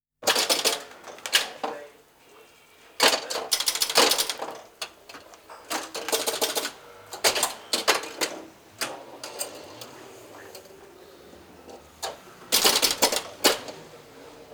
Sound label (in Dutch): flipperkast mech 1970s